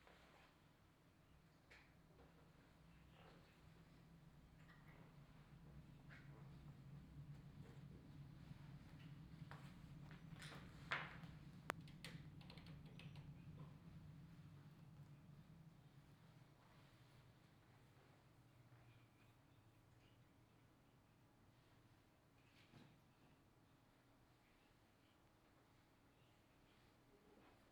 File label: office; AASPAudio